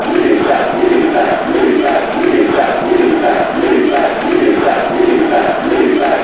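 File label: fanchants, football chants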